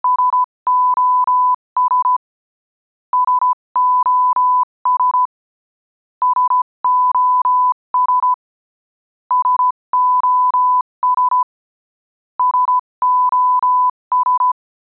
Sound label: Morse code tone SOS loop x5